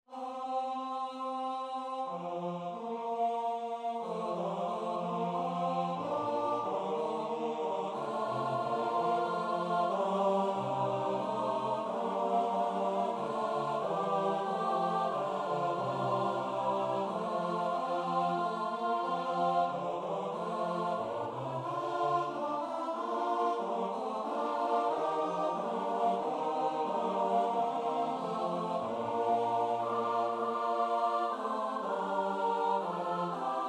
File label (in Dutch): S-A-T-B, koorpartij oefening; Hassler (Hans Leo); Missa I super dixit Maria